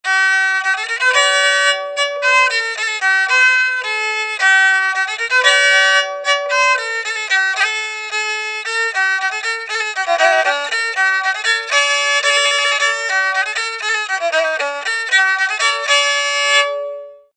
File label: Rebec solo